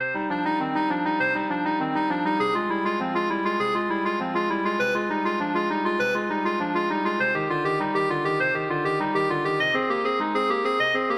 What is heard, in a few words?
bach prelude c minor, clarinet